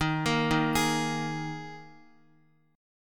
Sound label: Eb Suspended 4th Eb Guitar 4E 4A 5D 5G 5C 6F; Ebsus4 arpeggiated; JGuitar